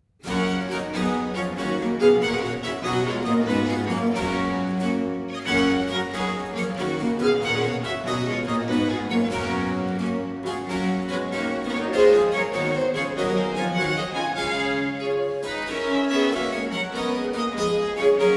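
Suite in G major for two violins, viola & basso continuo - Jigg; Purcell; Complete Chamber Music; Brilliant Classics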